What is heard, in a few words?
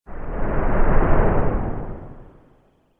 Modulation Whoosh; Whoosh Sound Effects